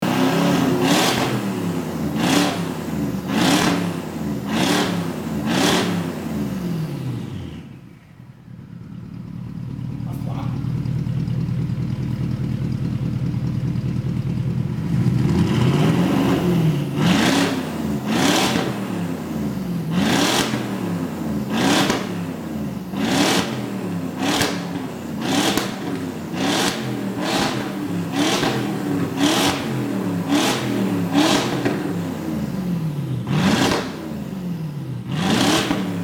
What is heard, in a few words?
Porsche Cayenne Turbo